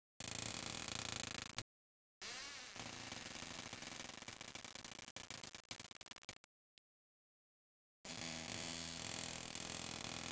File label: creacking wood; cs manoir